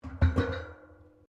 Pipes Metal Impact Tyre; Materials

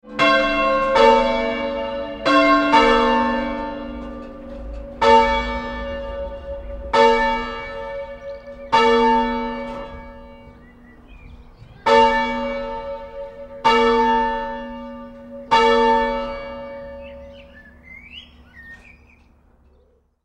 Church Bell; Tom Hutchings

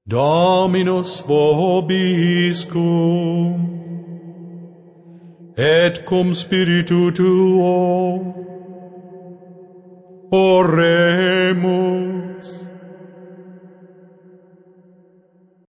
Latin Prayer, Male, Singing

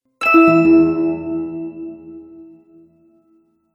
Cartoon Game Emphasis Sound; Cartoon Sound Design Sound Effects

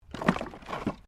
Wood Movement Minor

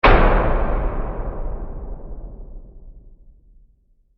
Horror Hit Low Reverb Impact; Production Element; Hit Sound Effects; SFX Bible 6

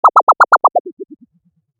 Lunar Laser Bubbles, laser sound

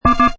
Keypad, Servo Panel Sound; Buttons; Multimedia

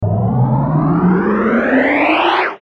Ascend Warp Flange; Blastwave

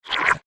Monster Lick; Monster Sound Effects; fantasy sound effect

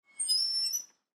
Gate Metal Squeak, Gate Metal Squeak Fast; Household; Gates; Sound Effects:Doors

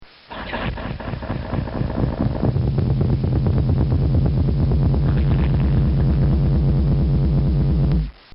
Electronic Pulse

